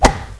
base hit

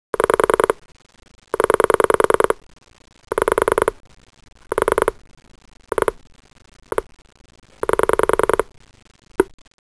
Telephone Dialing Pulse; Soundsation

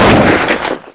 SHOOT; BERMUDA

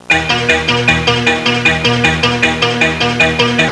hi tech; Sound Library 2000; Wayzata Technology; Music In

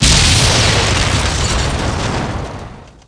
expl med; MAX

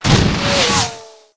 plan hit; planecr

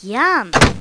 yum; My Science Fair

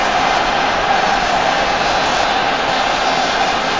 atmos; samba1; soccer

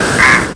Zoom; Mr. Cat's Quest 3.1

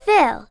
fill; Crazy Paint: On Location

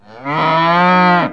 bellow

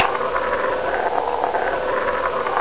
BALL HIT

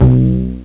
mutebass

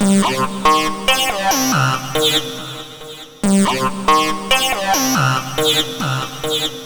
HiFi, Techno Melody; TechnoMusicMaker

